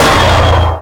metal break; Dystopia; physics

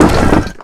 concrete break, physics; Dystopia

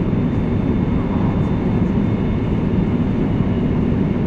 ambient; assemble

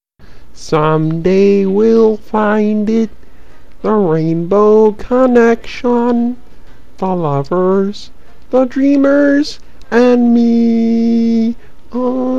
rain; dystopia; ui

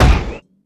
heavy metal, footsteps; player; heavyfeet; Dystopia

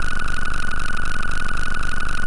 computer hum; Dystopia; vaccine

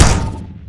hit scan; weapons; Dystopia; cyber

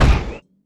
heavy vent, footsteps; player; heavyfeet; Dystopia

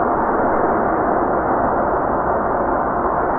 street

